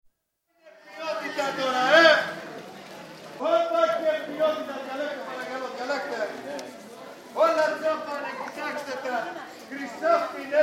Greece: Clothing market, open market with stallholders' shouts (otherwise fairly muted) & distant traffic; Stores And Mall Sound Effects; BBC